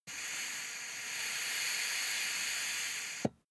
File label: air pump ball